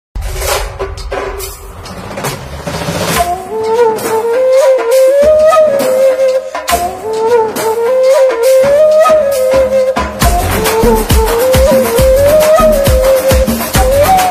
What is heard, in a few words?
ringtone promo bgm; thalapathy vijay